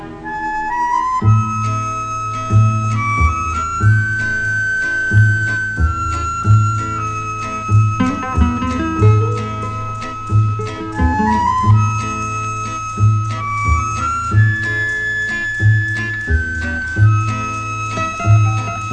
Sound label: Guitar